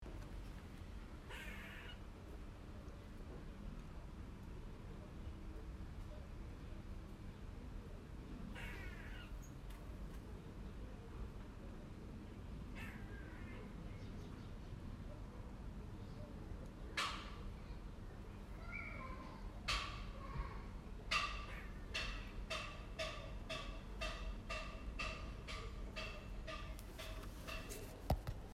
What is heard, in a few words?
squirrel alarm call; audiomo 2019